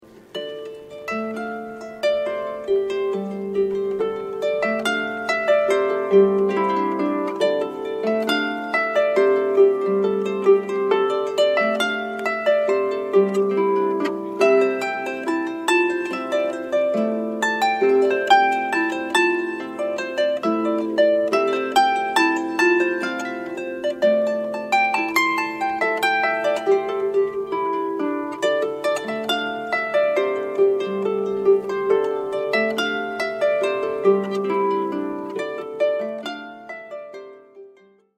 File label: Celtic Harp